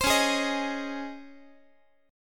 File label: Db Major 7th Suspended 2nd Db Guitar; x,x,15,11,12,14; DbM7sus2 strummed; JGuitar